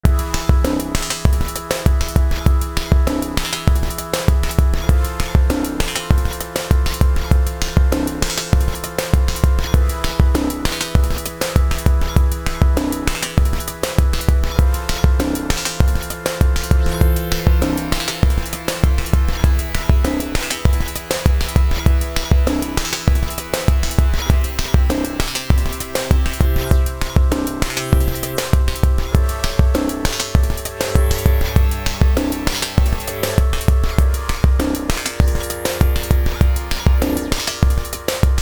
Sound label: IDM Beat, Korg Radias